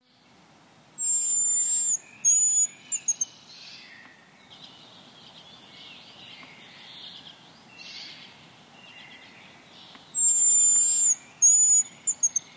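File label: hazel grouse voice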